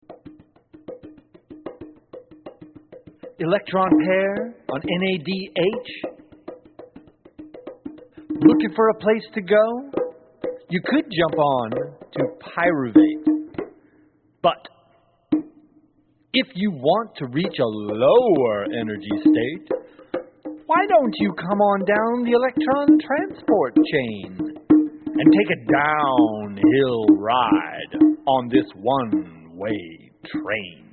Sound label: lofi